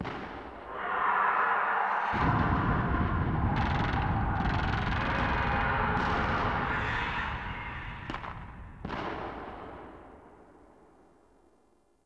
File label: Ambient Distant Infested Explosions; Nineteen Ninety Nine